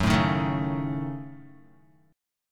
Gb Minor 6th Gb down Guitar; strummed; JGuitar

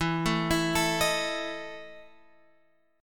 E Major 7th Suspended 4th E down

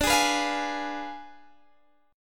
D Diminished 7th D, Guitar, Open D

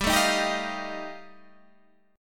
G 7th Suspended 2nd Sharp 5th G chord

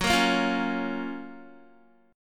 Gb Major 7th Gb Guitar

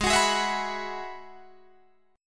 Ab Minor 7th Sharp 5th Ab, down, Guitar, Drop D

